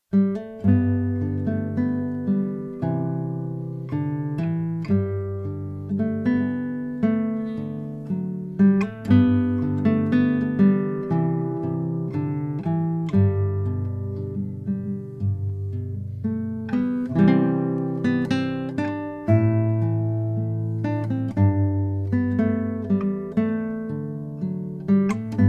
Guitar; Assassin's Creed Syndicate

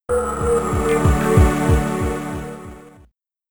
Heavy Metal Jingle; amiga

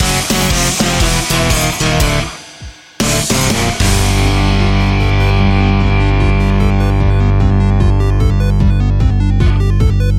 Defeat, Jingle; big boy boxing demo ost 2023

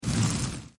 Burning; yet another pixel dungeon android

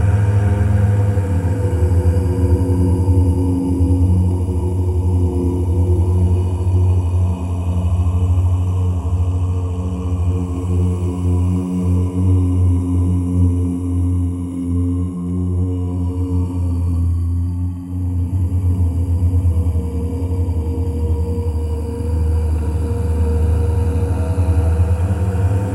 Ghost Choir; conan exiles gamerip 2017

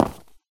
footstep concrete land; SFX; Environment; MONO; Footsteps; ProSoundCollection v1 3

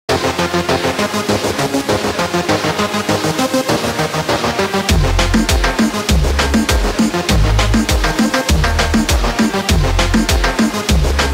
Music Jam; Club Penguin; Ultimate Jam Event Trilogy; Windows; Casa Fiesta Exterior

